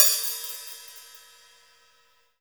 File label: HH OP, Drum Dry; East Collexion; Drum 1